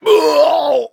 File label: hit; Cookie Run